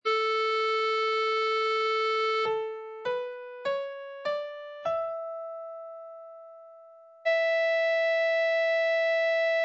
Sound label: a major e